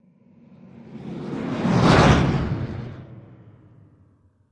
Starship, Small, By from Left to Right, Point of View of Pilot